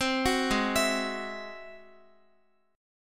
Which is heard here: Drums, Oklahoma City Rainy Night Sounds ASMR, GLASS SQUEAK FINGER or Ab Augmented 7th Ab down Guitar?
Ab Augmented 7th Ab down Guitar